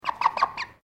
BIRD CHICKEN KOEYOSHI CLUCKING TRIPLE, Chickens in northern Japan; Birds; Animals